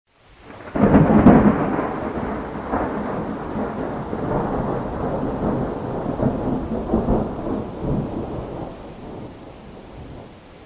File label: rain